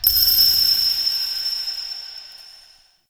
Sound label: Interior Orbital Station Ring; Warframe; Tau